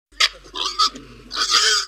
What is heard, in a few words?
Pet Warthog Idle; creatures pets